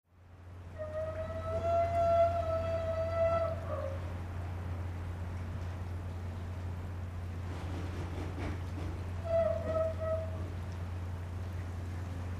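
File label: Dock Ambience night Light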